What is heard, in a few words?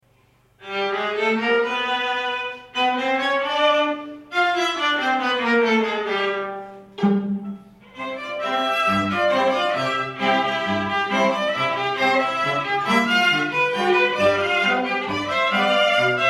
Ragtime